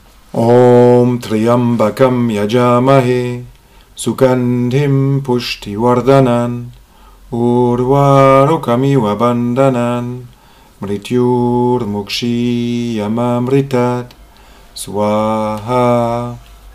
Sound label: yagna mantras tryambakam